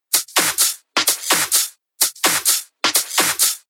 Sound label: 128 BPM